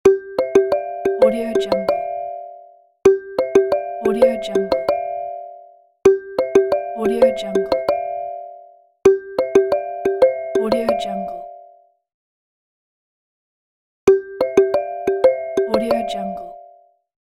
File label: phone ringtone